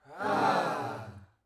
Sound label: Human Crowd Sigh Soft